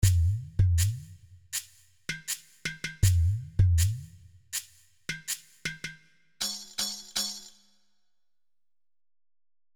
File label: Ethnic Kit; Quidam